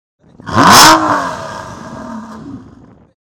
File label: Revving Up; V12 Vantage